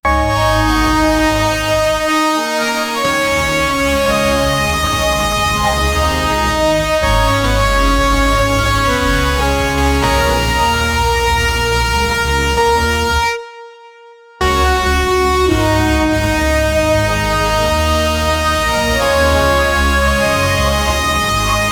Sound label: future rave